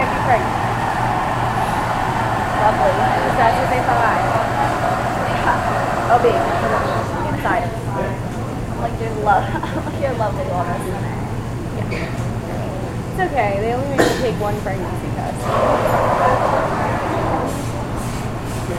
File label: Library Recording